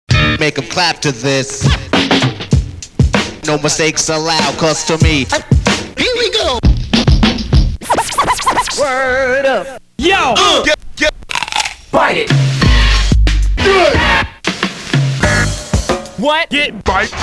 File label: breaks; Super Duck Breaks